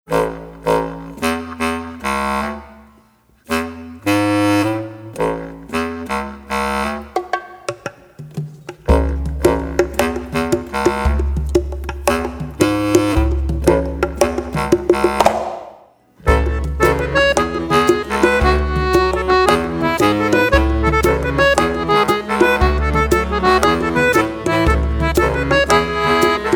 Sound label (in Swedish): Basklarinet; Phonix